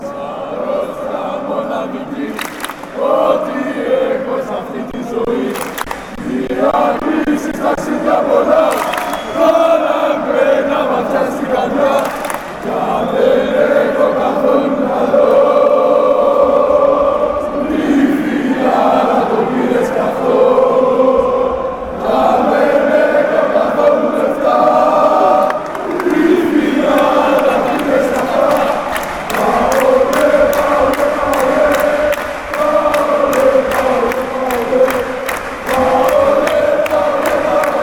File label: panathinaikos chant